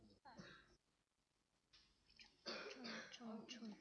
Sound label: gun